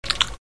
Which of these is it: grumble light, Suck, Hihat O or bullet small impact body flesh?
Suck